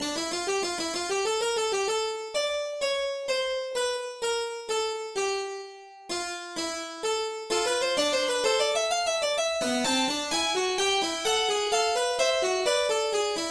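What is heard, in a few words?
No 6 in D Minor BWV 875, MIDI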